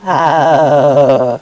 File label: throat, electron; Easy Personal Web Page